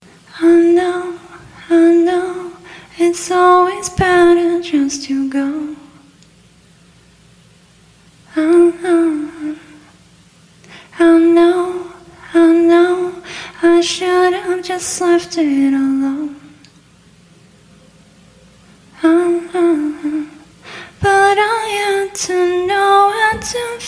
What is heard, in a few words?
90BPM Key Am